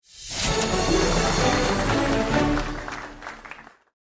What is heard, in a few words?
Congratulations; DailyChallengesPopup